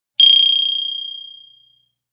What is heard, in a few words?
Clickie; Clicks; Multimedia